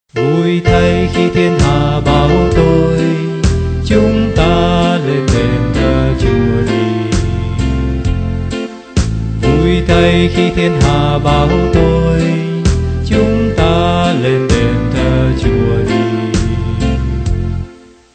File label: BASS